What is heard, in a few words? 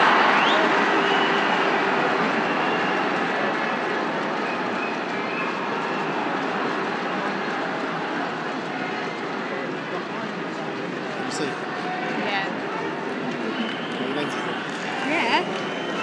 england match boo